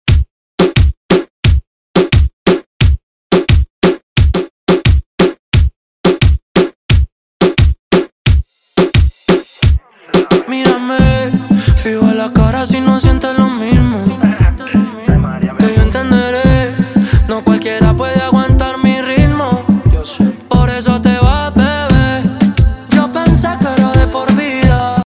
Intro 88 BPM

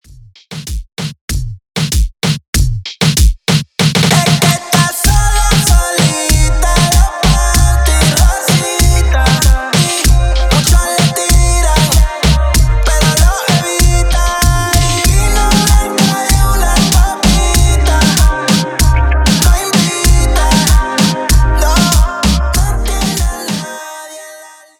Rosita Intro Verso 96 Bpm

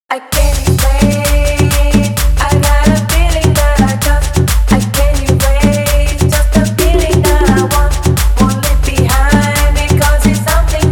intro outro 130 bpm

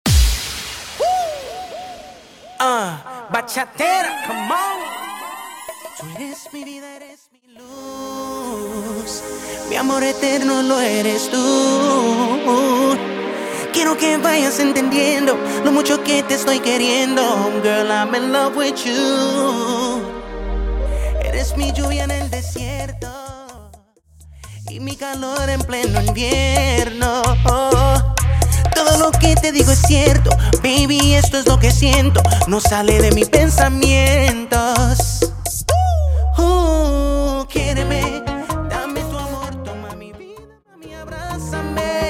Acapella Starter 128BPM

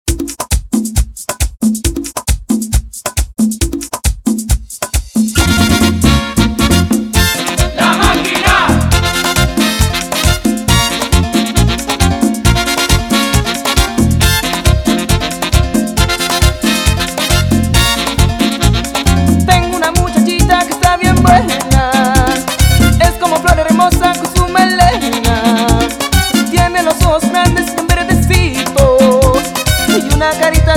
House Remix bpm 135

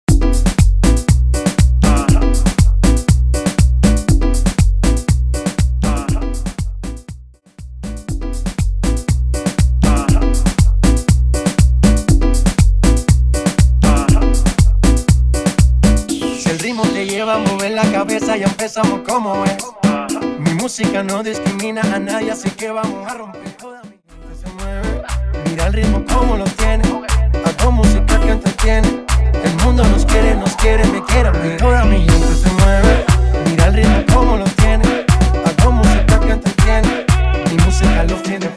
Mambo 120 BPM; Mambo Project

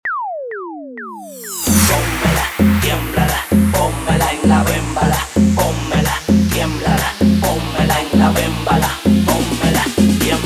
intro outro 130 bpm

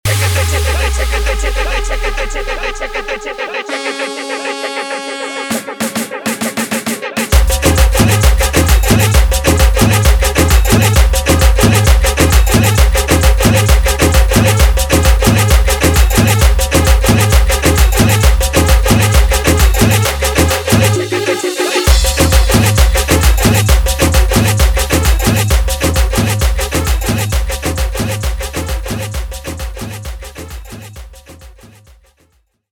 intro outro 132 bpm

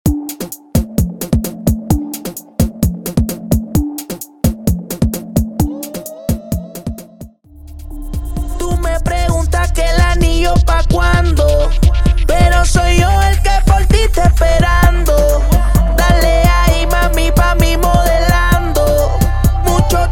Transition 130 BPM

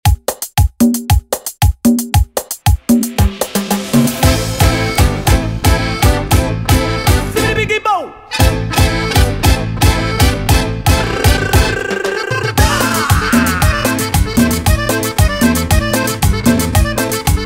intro outro 115bpm

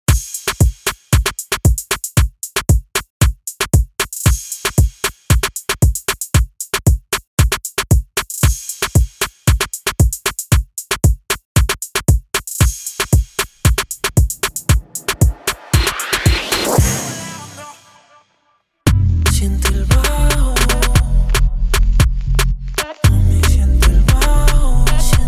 Intro Outro 115 BPM

